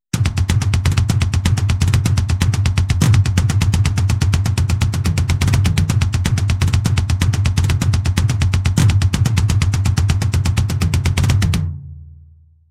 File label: drum pattern